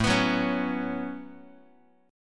A 6th Add 9th A down Guitar; A6add9; strummed

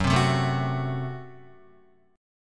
Gb Diminished Guitar; Gbdim strummed; 1,3,0,2,0,2

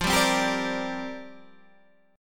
C 7th Suspended 2nd Suspended 4th F

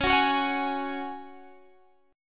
C sharp Suspended 4th C sharp, chord

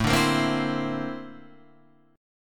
A sharp Major Sharp 11th, A

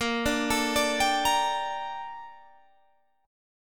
A sharp Major 13th, Guitar Open G

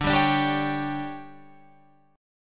D Major 7th Suspended 4th, Guitar, Open F; DM7sus4 strummed

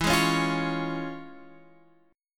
C sharp Major E; C#/E Chord {X 7 6 6 6 9} strummed

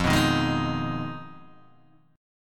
F Minor 7th Flat 5th F down Guitar Open G

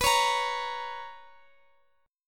B Major 7th Suspended 2nd Guitar Open F, chord; BM7sus2; strummed; JGuitar

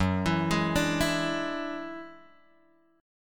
F sharp 7th Suspended 2nd Sharp 5th; F#7sus2#5 Chord {2 X 2 1 3 0} arpeggiated

